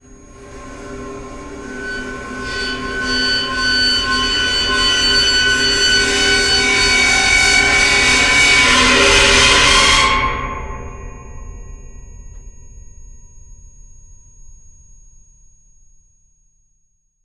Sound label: sound sculpture edge; sonniss gdc